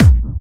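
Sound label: BD Clubby, bass drum; VEC1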